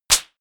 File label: clap, percussion